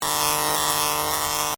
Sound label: TESLA COIL SMALL OPERATING, Small tesla coil; Zap Sound Effects; Zaps (Sound Effects:Electronics)